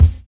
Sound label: DISCO BD, DISCOKIT; USB Soundscan vol.11 - Drums Dance & Groove; AKAI